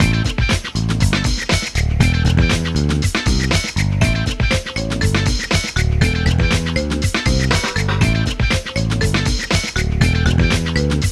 Podium; crash team racing nitro fueled complete gamerip; Crash Bandicoot - Coco Bandicoot - Fake Crash - Crunch Bandicoot - Baby Crash - Baby C

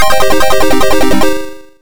Level End (Eggs Not Collected); prehistoric tale a; atari st

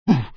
SFX Hurt

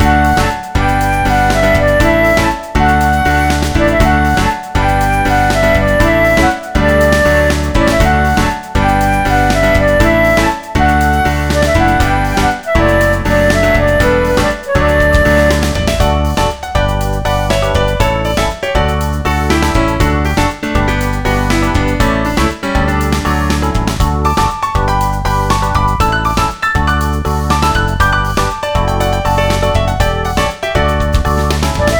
Sport Loop; The Legend of Gwen; Windows

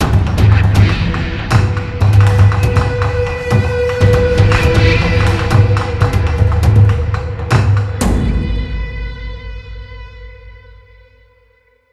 Mission Success Cue; Warframe

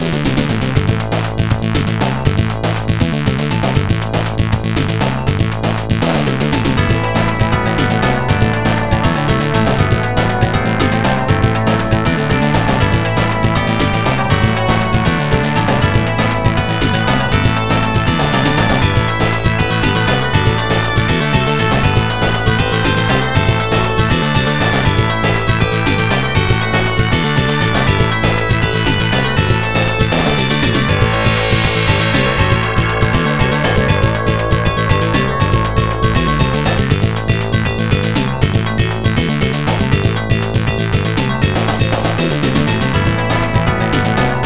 Story Sequence; shura no mon genesis

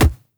Block Hit; Afterbern Democralypse; Windows

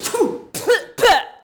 female choke

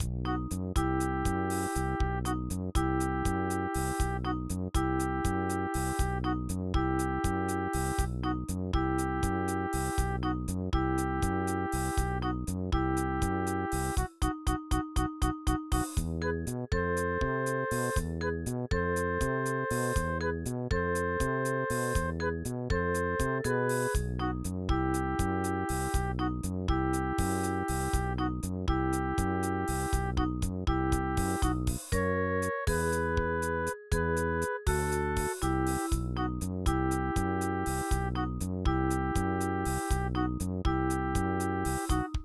Rumba, Roland; Blake Stone Aliens of Gold; MS-DOS

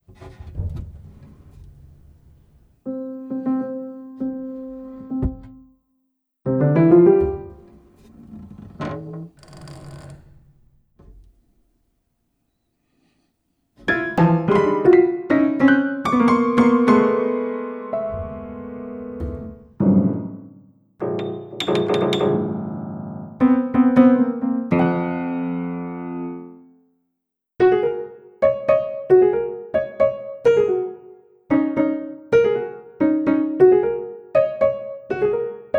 He's Playing Piano; Pizza Game original soundtrack

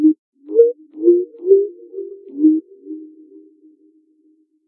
Jungle Ambience Bird Melody; And Yet It Moves

